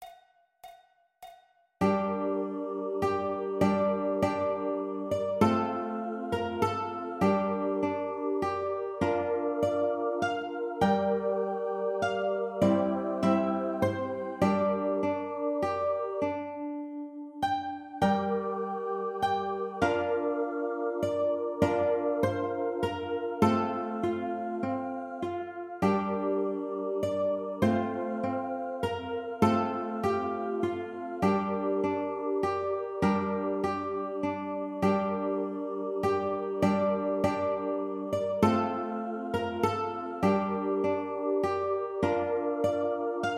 Scarborough Fair Ukulele; Jolly Roger Ukulele Book 1

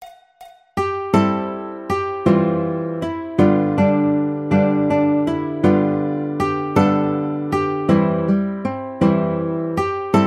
Carnival Of Venice Baritone, ukulele; Jolly Roger Ukulele Book 1

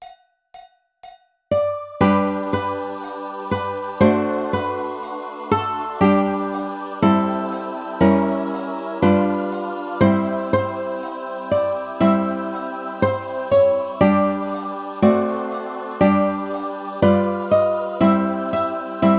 Ukulele

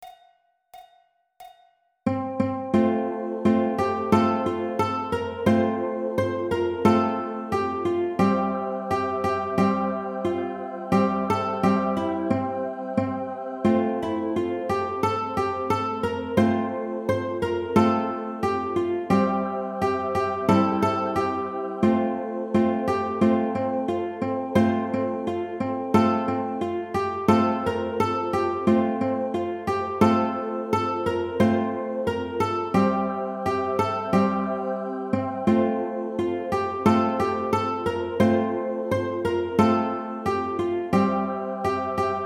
Simple Gifts Ukulele; Jolly Roger Ukulele Book 1

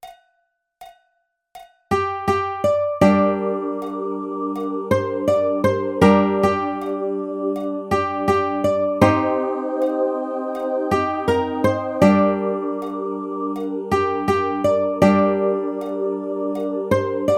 Wayfaring Stranger Ukulele

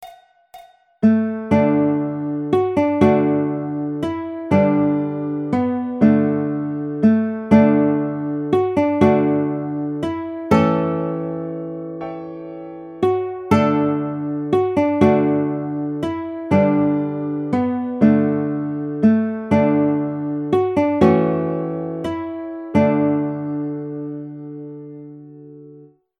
Baritone, ukulele; Amazing Grace

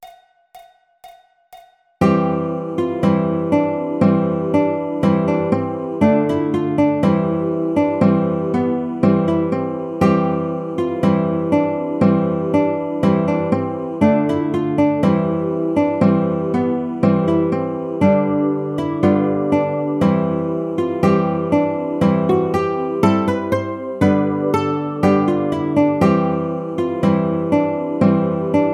Baritone, ukulele; Deck The Halls

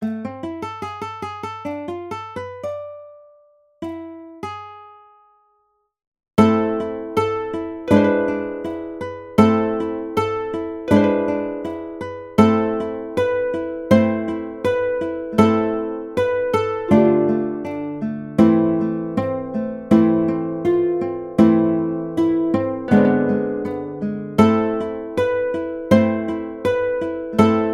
Sakura Sakura Conductor Baritone, ukulele

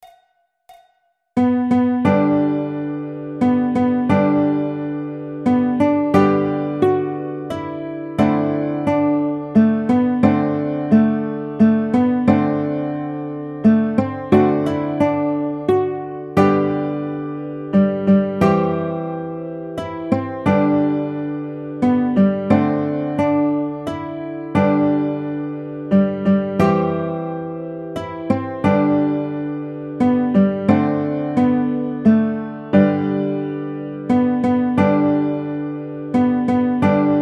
Baritone, ukulele; Brahms Lullabye

